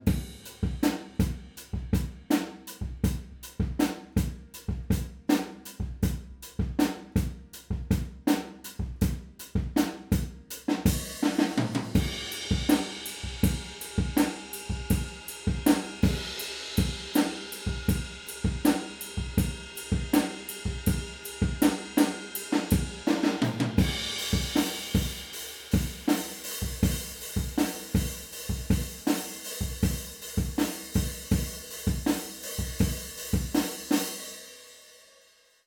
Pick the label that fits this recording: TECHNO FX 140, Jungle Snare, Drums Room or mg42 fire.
Drums Room